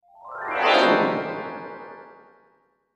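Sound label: Music Transition Vibraphone; mallets vibraphone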